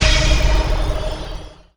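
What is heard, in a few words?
synth, Production Effects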